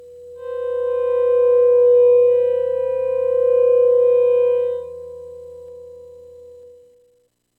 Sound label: Cluster Swell